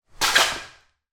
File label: foley footsteps skid feet hard screed floor reverberant corridor